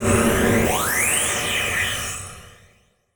Title Transition Laser Long; Adobe; Production Element

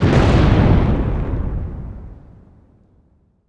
Explosion Fireball Blast Fire; Fire and Explosions

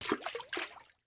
Liquid Water Water Water Balloon Dropped In Water

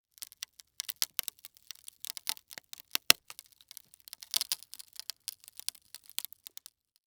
Horror Wood Crackle

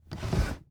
Drawer Open; household

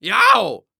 Human Male Yells Yow

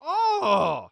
Human Male Ahh Disgusted Ahh

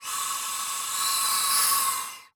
Industry Tool Air Blowing Through Tube

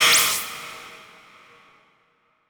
Multimedia Internet CD-Rom Flash Electronic

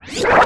Multimedia Internet CD-Rom Flash Pop Up

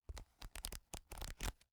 Technology Vinyl Multi Page Turn

